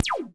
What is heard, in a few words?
ZAPPLER